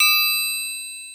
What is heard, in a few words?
FM TRIANGLE; Crypton - DrumBase5000